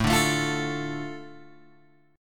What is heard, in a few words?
A Suspended 4th Sharp 5th, Guitar Open G